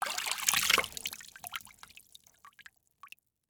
Liquid Water Movement In Plastic Bucket Finger Swirling Short With Drips